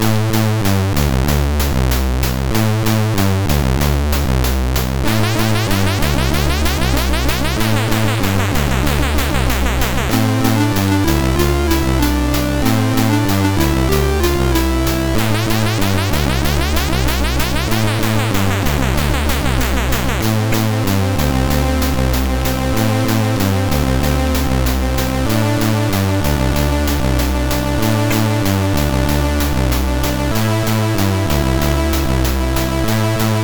sid, MOS6581R3

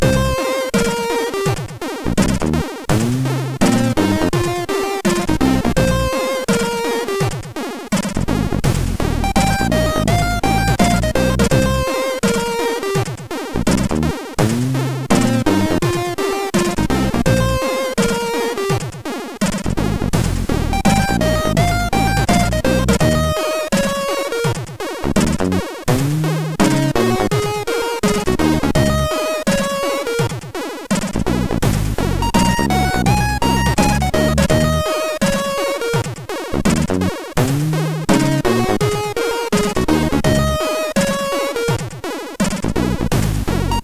Short Jingle, MOS6581R4; hvsc

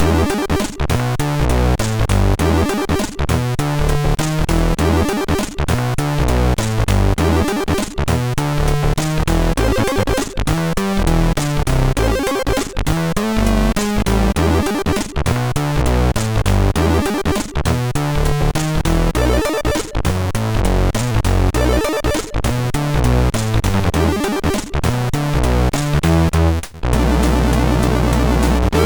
Blues, MOS6581R3; Spike Super Blues Experience

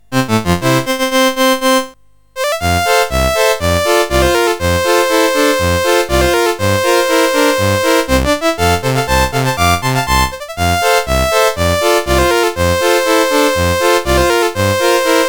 French Military Song, CSG8580R5

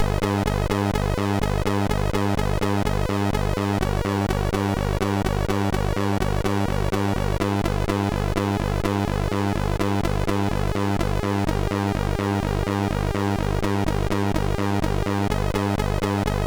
Voice, sid MOS6581R3

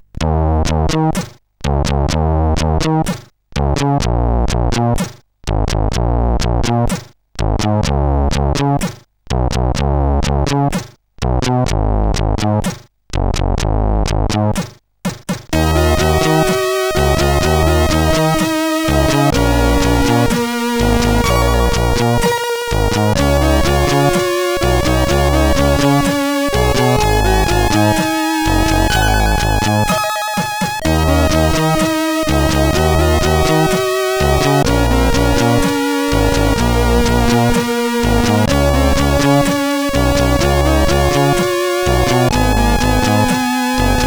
Splash intro, sid CSG8580R5

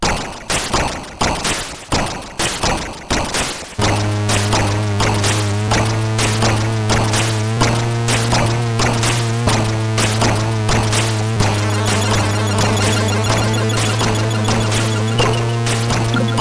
Second Sound, sid CSG8580R5

